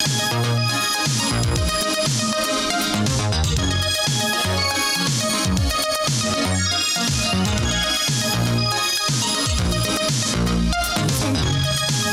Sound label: intro, Vaporwave; Vaporwave Library Project